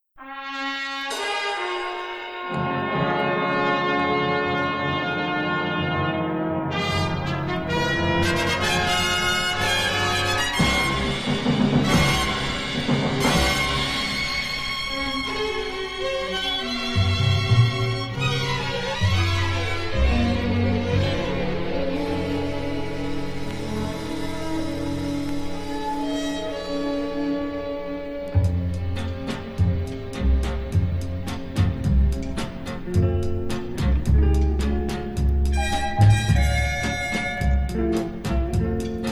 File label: strings